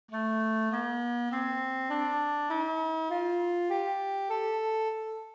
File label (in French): ouchairan, La gamme ouchairan-houssaini; GAMME EN MODE DE LA = OUCHAIRAN